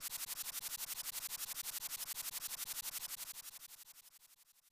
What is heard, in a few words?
Dog Scratching Himself; Family Dog; SNES